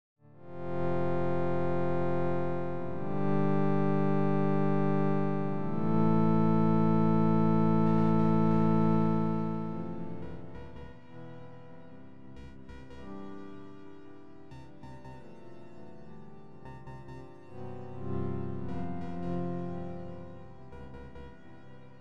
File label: cave music